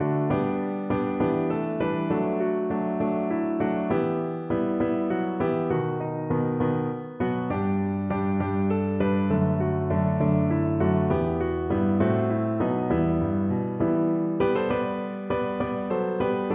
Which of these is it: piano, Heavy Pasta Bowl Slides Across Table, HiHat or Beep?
piano